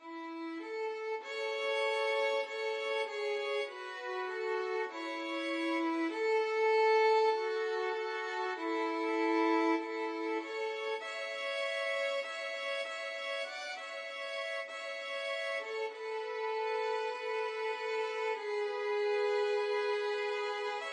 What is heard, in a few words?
were you there, violin duet